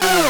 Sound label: synth